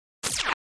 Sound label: Laser Fire Wash